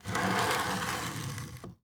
Window Frame Close; HauntedBloodlines; SFX Producer

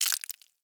Blood Squirt Spill; Haunted Bloodlines; SFX Producer

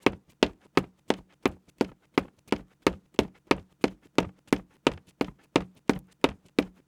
Footsteps Wood; HauntedBloodlines

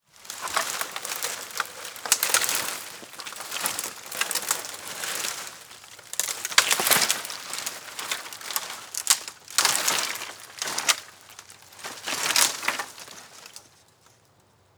Footsteps Forest; HauntedBloodlines; SFX Producer

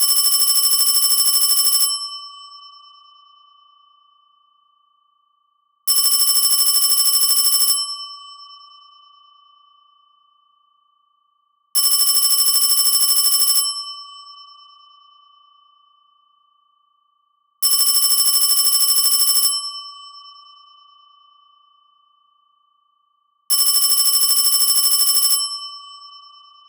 Telephone Ring; MinorHallutinationsSounds1

